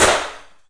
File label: stun bomb; 3D Sound - 3D Keys VOL-1; MEAN 3D